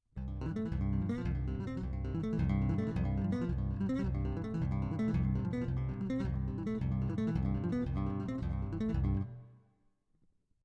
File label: bas riff